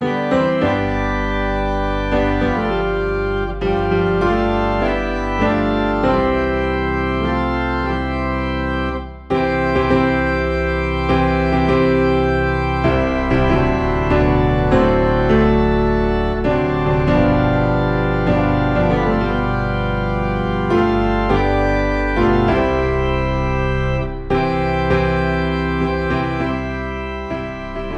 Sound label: Piano and Organ Low in C